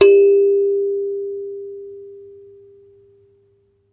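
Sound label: KALIMBA A4; ILIO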